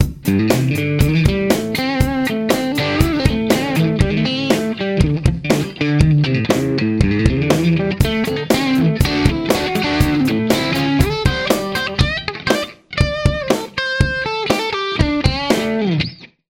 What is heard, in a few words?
layer guitar